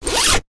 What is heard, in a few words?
zipper long; soundscrate